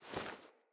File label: Foley Walk Default, Default Clothing; Player